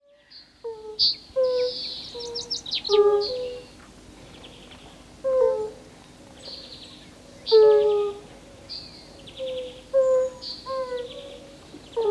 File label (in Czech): hlas zvuky zvirat